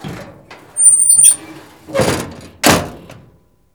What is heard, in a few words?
DOOR CLOSE